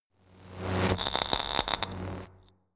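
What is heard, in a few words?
jam, electronic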